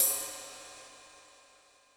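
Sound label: Rock sizzle, Yamaha DTXpress Cymbal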